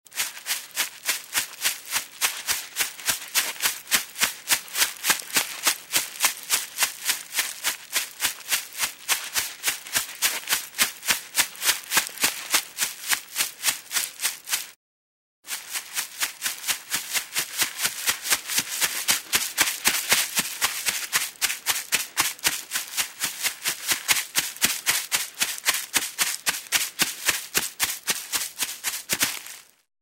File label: Running on the grass; steps